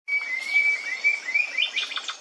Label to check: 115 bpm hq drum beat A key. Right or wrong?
Wrong